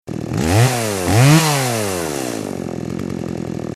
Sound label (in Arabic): موتوسيكل